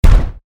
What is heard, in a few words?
door kick